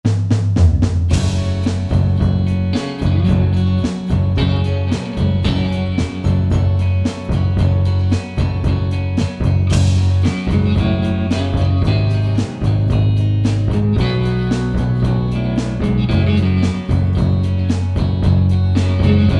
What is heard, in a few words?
western noodle